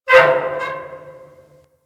Metal Clunk Squeal; Ambience; Props; Warframe; Orokin Derelict

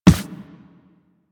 Run Default, Footsteps; Warframe; Operator

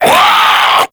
Infested Ancient Pain; Enemies; Warframe; Puss Ancient

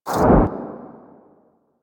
Operator Wraith Void Exit; Warframe; Focus; Powers; Wraith Movement

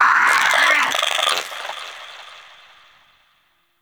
Shrimp Lantern Vocal Death, Creatures; Baliq

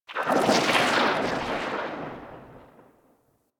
Sentient Start Move; Enemies; Sentient Movement

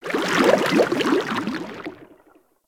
idle bubble shutdown; Warframe; Sharkwing; Vehicles

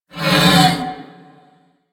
Ram Pain; Duviri; Animals

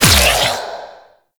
Stack Filled; Warframe; Warframes

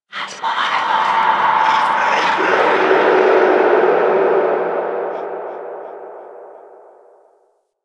Moon Whispers Medium, Ambience; Warframe; Orokin

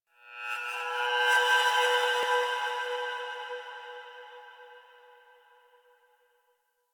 Texture One N, Ambience; Warframe; RailJack

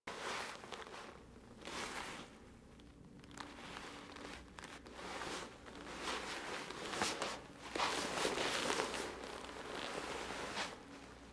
Orbiter Wake Up Foley Operator; Warframe; The War Within

